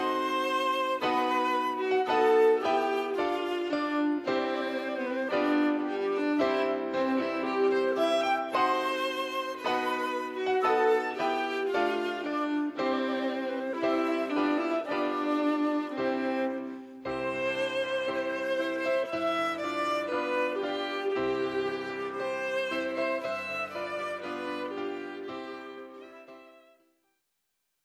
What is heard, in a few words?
Wedding March